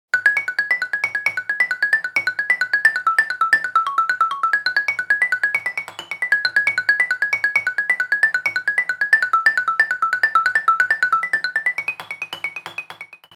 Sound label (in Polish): ksylofon; idiofony